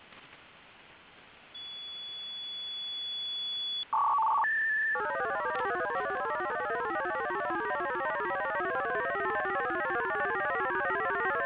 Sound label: fsk-2 125bd; msk 106165 khz; mix mode